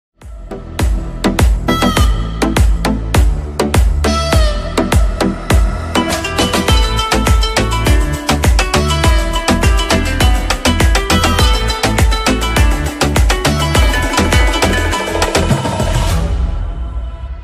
Instrumental Ringtone

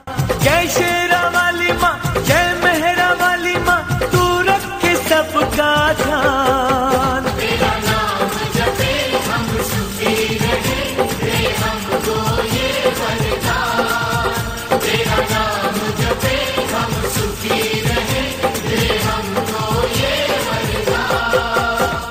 Bhajan Ringtone

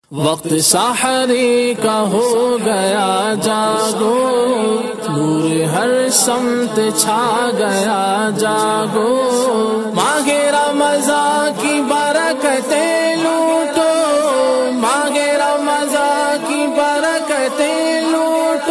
Sehri Alarm Tone; ringtones

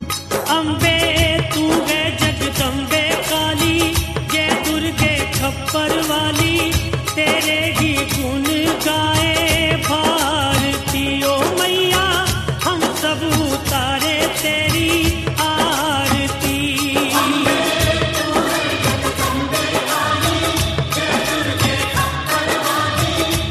Bhajan Ringtone

